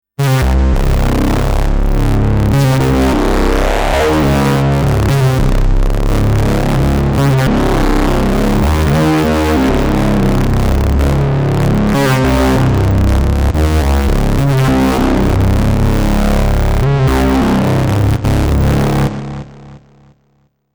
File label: Analog drive mega BASS; uno synth pro desktop